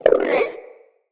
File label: Wyrm Idle, Vocals